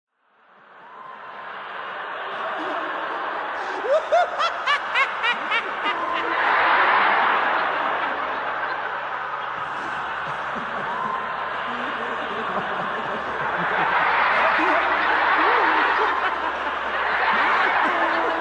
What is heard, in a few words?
Laughter